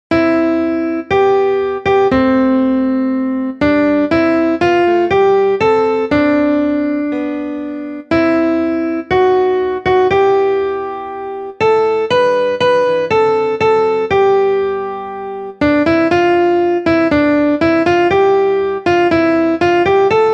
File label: Oh Canada melody